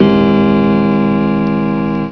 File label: Grandfather Clock, Bells; TookWare